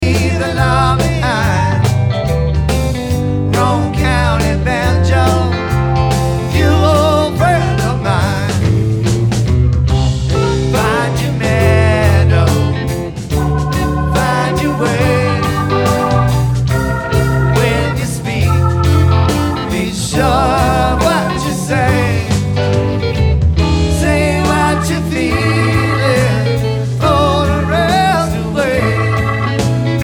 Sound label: Banjo